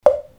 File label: mokugyo; 効果音ブロック